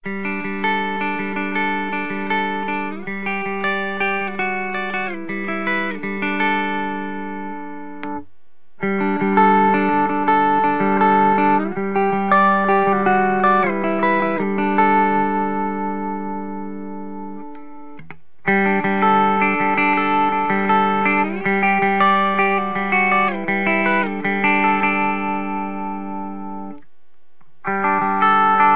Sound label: cruiser prs guitar picking; GUITARS